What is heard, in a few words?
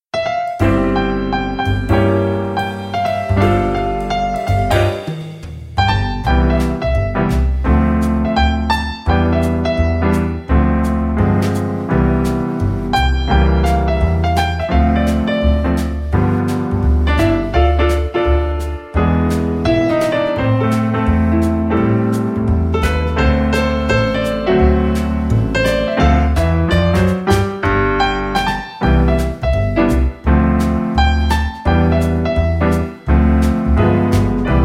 Holiday Jazz